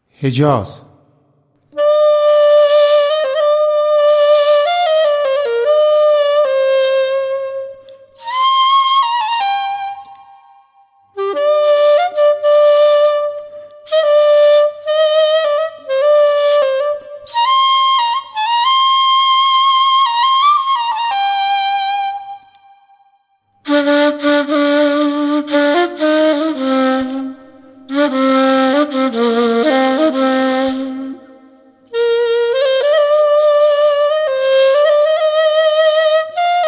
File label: hejaz; Radif-Jahangiri